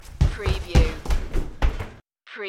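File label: Quick Run Wood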